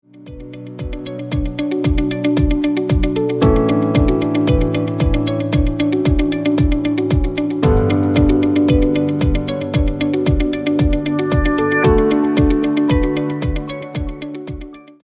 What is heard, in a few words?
minimal